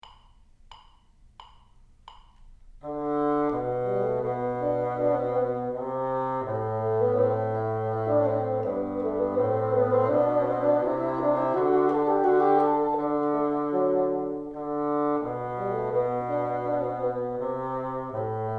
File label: together, bassoon